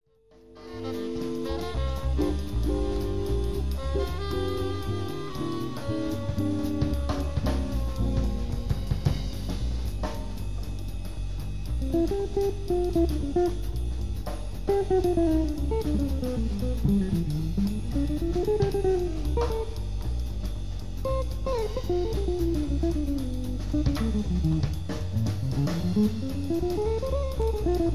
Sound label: Quartet Invention